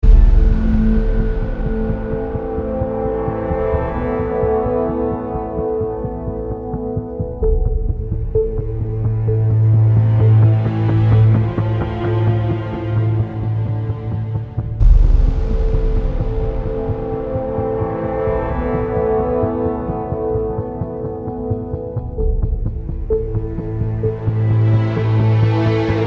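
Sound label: Music Loop; Mx Post Ravine Loop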